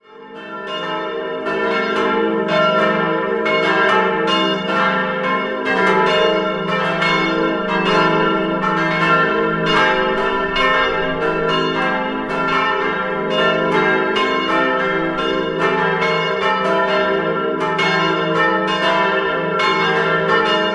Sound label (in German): Bruck Opf, glocken